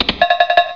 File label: Get Letter; BOOM